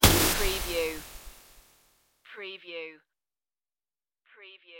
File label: futuristic bullet impact